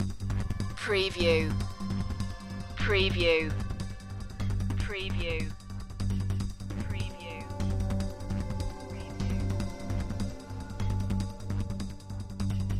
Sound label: breaking news loop